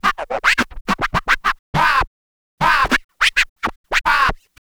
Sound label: Scratching, Vinyl Scratches